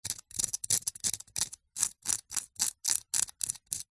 foley stone rock carve engrave scratch with small sharp metal spike